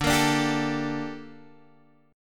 Bb Major 13th D

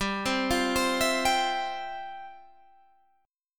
G 13th Suspended 4th G, chord; G13sus arpeggiated

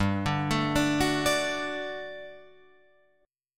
G Major 7th Suspended 2nd G down Guitar Open F; GM7sus2 arpeggiated

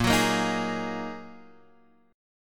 G sharp 7th Suspended 2nd; 6,9,8,8,9,x; chord shape

